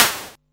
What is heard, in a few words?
Electro snare; Drum Hits; Snares; Nu Dance Electro; Zero-G; Soundclash